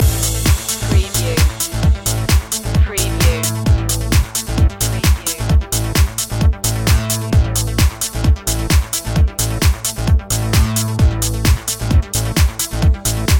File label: disco loop